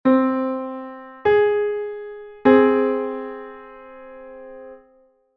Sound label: 0-800 minor sixth (12-EDO)